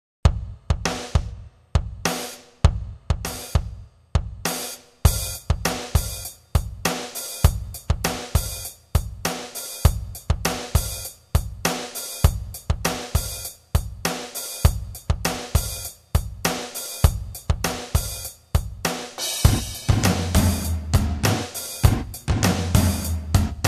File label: drums; Jedna sada bicích